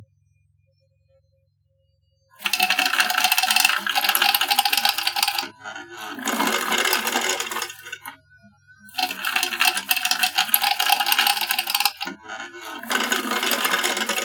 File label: shuffler, poker; mepoker